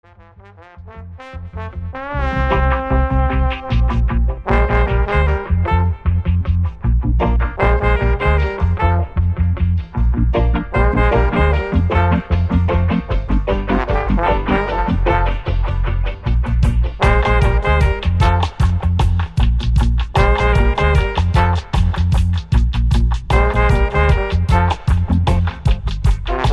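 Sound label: Horns, reggae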